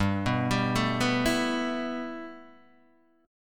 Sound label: G 6th Add 9th G Guitar DADGAD, chord